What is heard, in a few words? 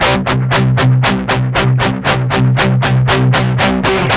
8TH C#3, Guitars; Spectrasonics; Hans Zimmer Guitars VOL-1; 128-JACKHAMR